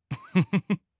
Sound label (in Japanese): フッフフフ